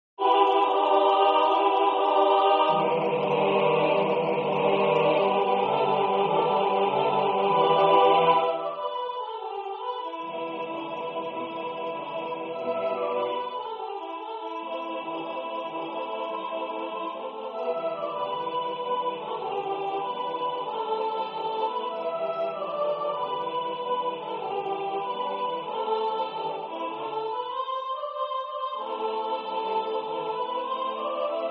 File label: Over hill over dale tutti